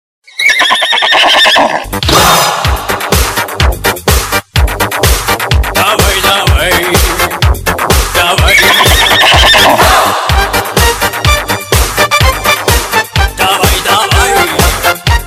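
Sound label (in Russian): ржание коня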